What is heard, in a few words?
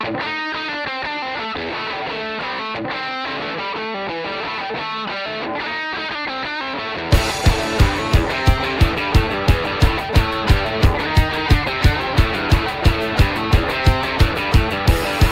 intro music; freak fortress 2; sinny scout